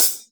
HH, ALL HH, Drums Acoustic; USB Soundscan vol.10; AKAI; 90s sample CD

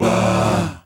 Male Voice, African Voices; Quazibeat - African Secret

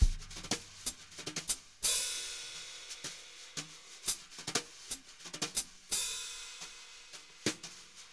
jazz brush loops

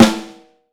Snare Drum; 6.5X14; Q Up Arts; Johnny.C's Vintage Snare Drum

